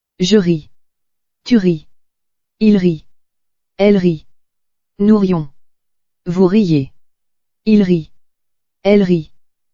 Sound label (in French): rire